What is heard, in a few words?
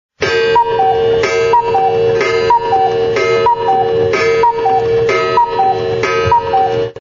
cuckoo clock sound effect